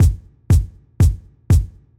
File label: kick